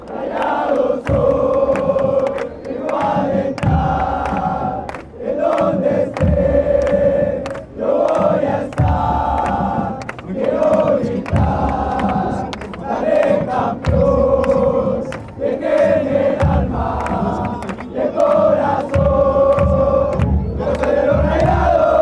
corazon, chants

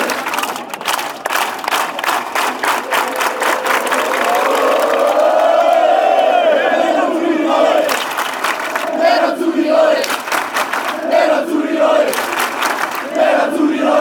black and blues, fan chants

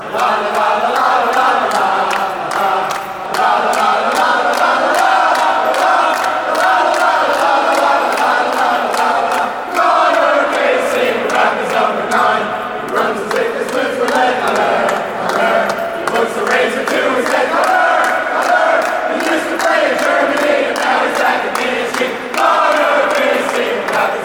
chants; conor casey

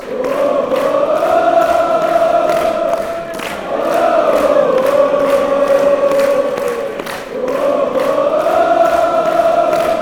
woah, fan chants

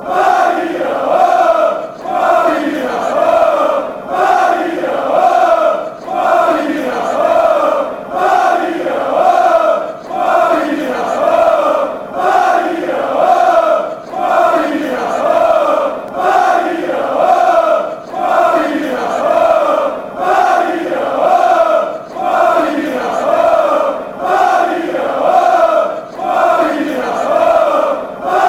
chant, fan chants; feynoord

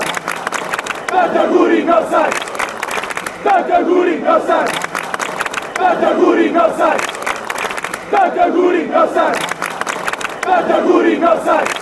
northside, chants

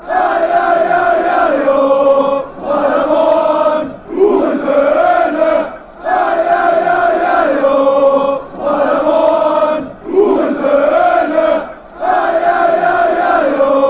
paderborn fanchants

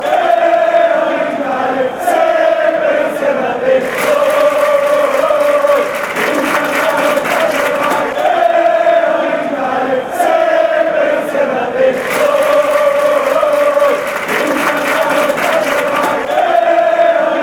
with you, fan chants

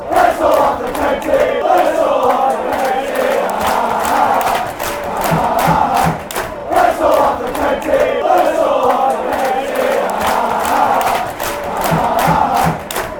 lets all laugh county

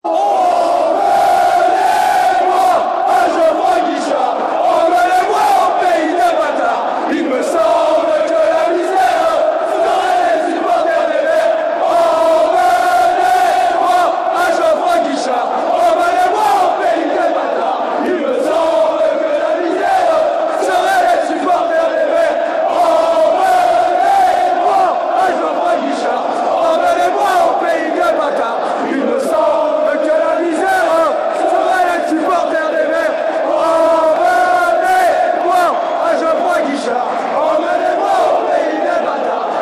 chant; strasbourg

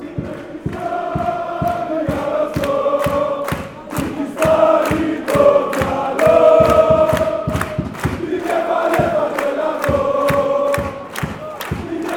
aek athens chant

